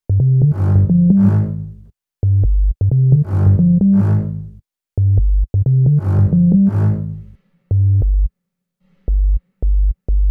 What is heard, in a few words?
Bass, Hip-Hop